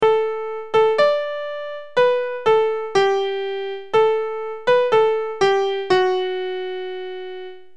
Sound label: calypso light